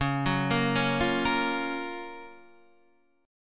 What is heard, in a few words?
Gb Major 7th Db, guitar